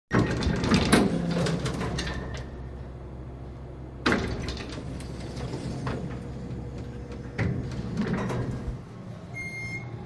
Elevator Sequence

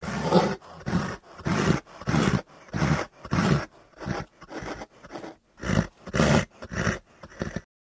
Horse Vocal Slightl, milling vocals

